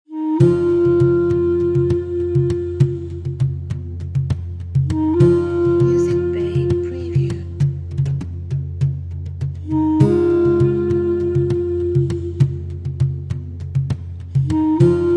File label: Arabian